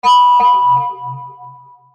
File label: messagetone; Nokia; 6270; Football